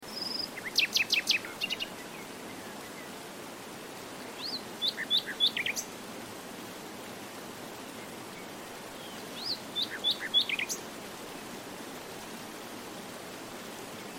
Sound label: forest water and bird; Nature bird singing and water flow sound